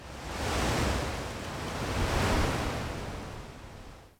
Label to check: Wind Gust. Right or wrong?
Right